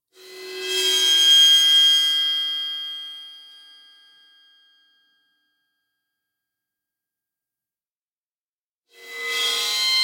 Instrument Cymbal Bowing High Pitch Ringing OmniMic; Guild Wars 2 (Original Foley Soundtrack)